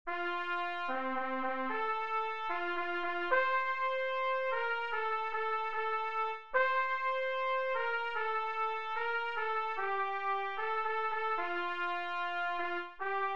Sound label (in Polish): Hejnał wsi Święty Wojciech